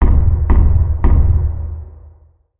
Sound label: freezer knock; ambient; pripyat